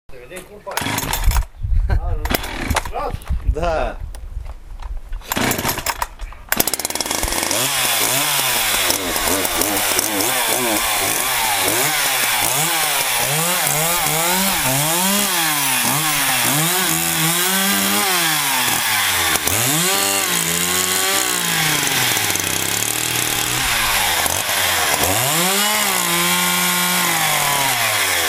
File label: chainsaws, STIHL MS 210